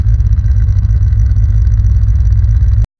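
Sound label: porsche 930 low rpm exhaust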